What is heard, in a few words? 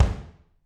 BD, Drums; Bob Clearmountain Drums I